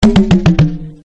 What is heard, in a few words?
glass cannon stack loss; killsounds; Balatro